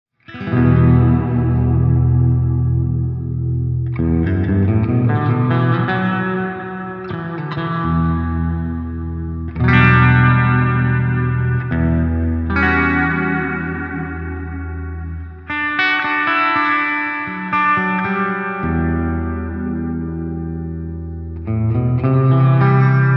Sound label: Line6 DL4 MKII Delay; ADRIATIC